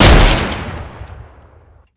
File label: m3 shot